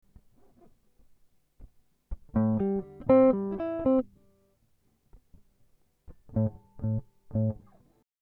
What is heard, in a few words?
Am60 GTR impro